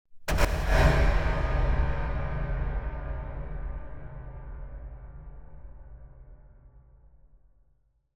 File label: Impact Fatal Wooden Hit - Higher; Hollywood Edge; sound designer's toolkit v2